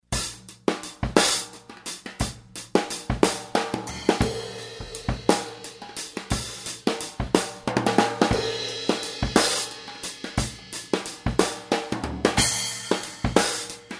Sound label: mono drum overhead